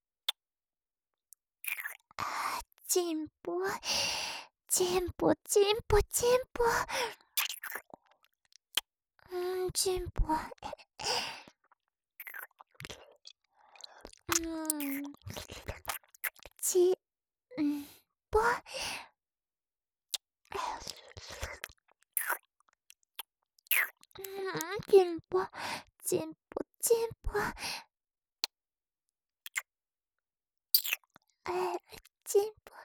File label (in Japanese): キス; ちんぽ